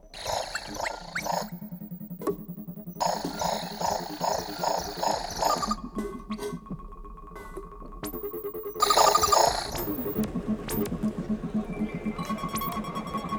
dessin complexe grande polyphonie

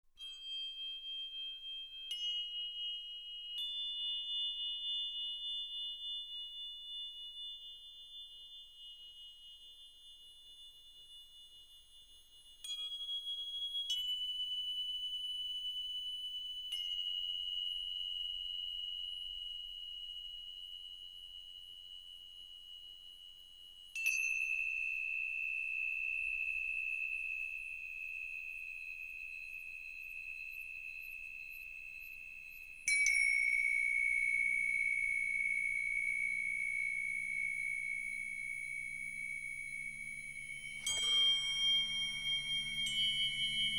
percus reson; energie libre

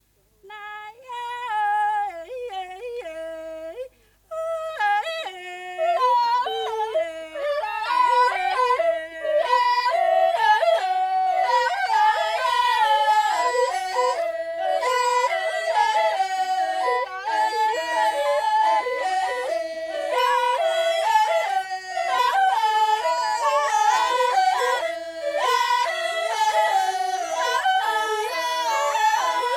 lignes noeuds pygmees gabon jodl 6 voix

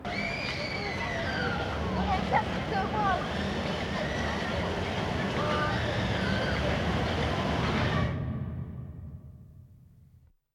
polyphonie images; M Chion; La ronde jardin jadis

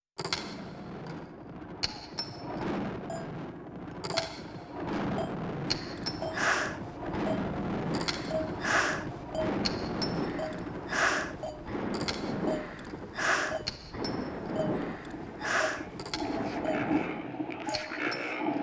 rythmes vitesses; D Fortez; station soupir

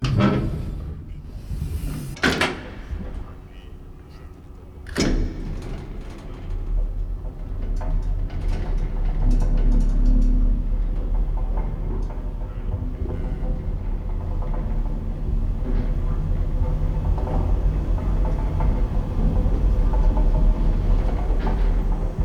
incrustation Tremblement transit

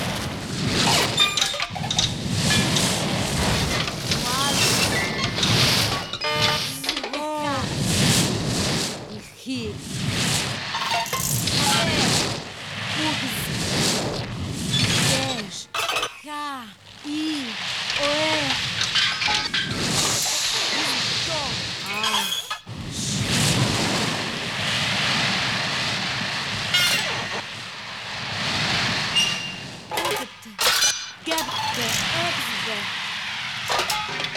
micro-mont; Ferrari; Heterozygote

